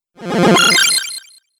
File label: Retro Random Bleep Accent; Video Game Sound Effects